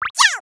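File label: slime attack; Seer; GamigoNA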